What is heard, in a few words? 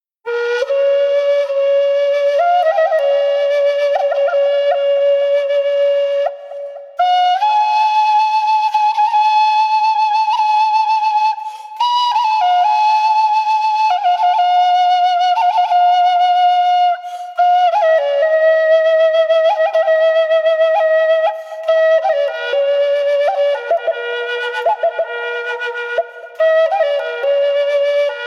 bone flute